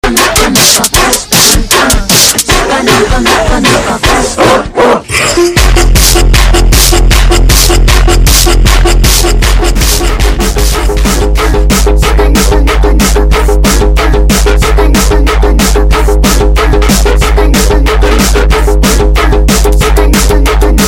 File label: Hardstyle Remix